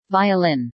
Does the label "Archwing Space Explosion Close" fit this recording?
No